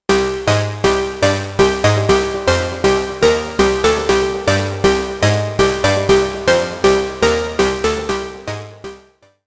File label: UNO Synth Pro X Presets